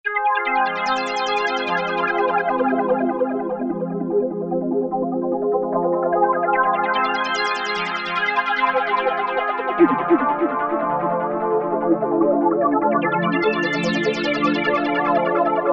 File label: Aquapeggios, Roland JP-8000; Factory Performances